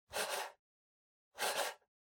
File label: PC Mouse Move Wood Short